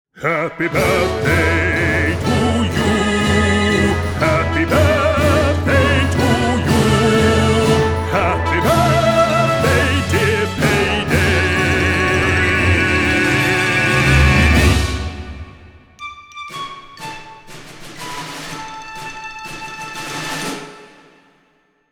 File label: Happy Birthday March; Payday 2; B-Sides; For Payday